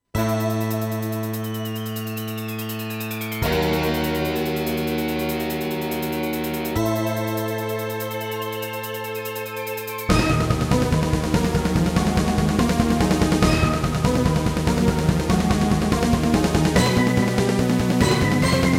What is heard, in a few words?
SC880; Dune 2